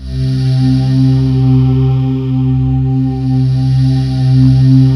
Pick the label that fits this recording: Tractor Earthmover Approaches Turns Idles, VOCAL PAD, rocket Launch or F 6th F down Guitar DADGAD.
VOCAL PAD